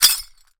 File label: tamborine